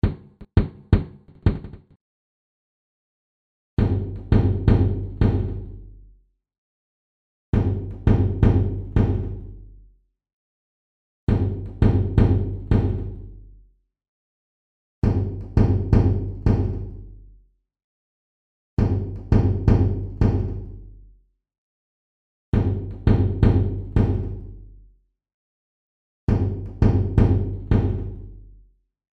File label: Ambi Verb HD Garage Hall